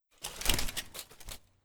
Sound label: Armour